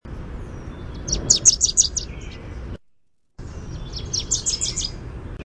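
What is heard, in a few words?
Wilson's Warbler song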